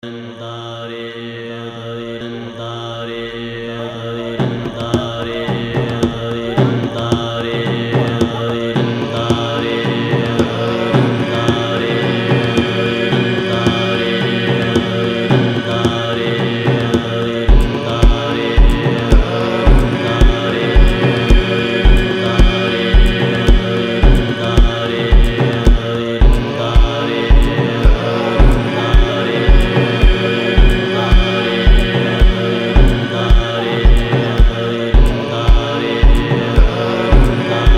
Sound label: asian colours